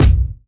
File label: kiks; drums single hits